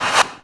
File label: reverse, FX; Vengeance Samples